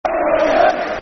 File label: Come On Wanderers, Football Chants (Soccer Song); Wolves; wolverhampton wanderers; fanchants